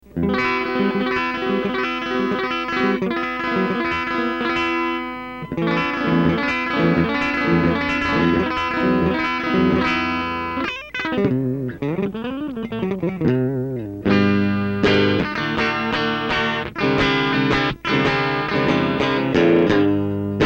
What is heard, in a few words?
CLEAN